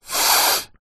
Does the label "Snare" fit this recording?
No